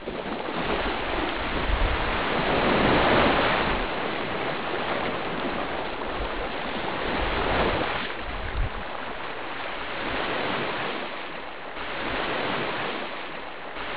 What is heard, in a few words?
waves